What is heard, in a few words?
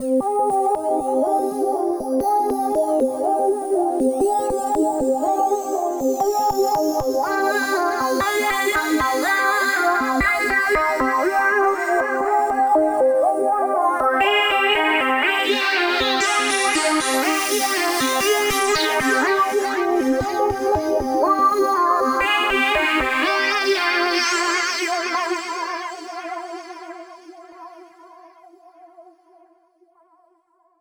melodic enchantment